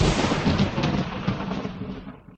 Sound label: lightning bolt sound; sfx; WILLIAMS; INDIANA JONES; Indiana-Jones-Original